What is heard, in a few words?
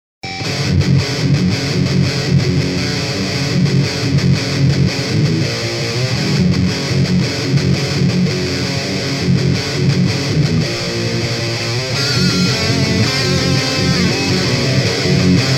Riff